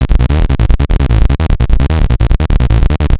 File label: Atonaloop, Industrial Analog